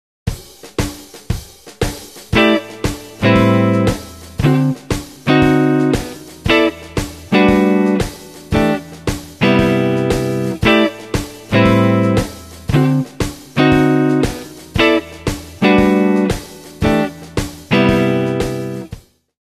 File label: Rhythm Guitar